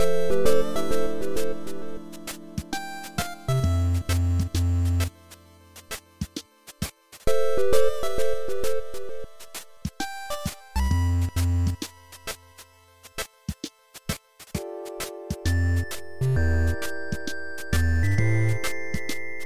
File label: music; gamemaker